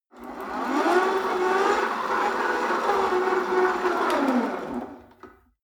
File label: rope trainer pull sequence medium, fitnessstudio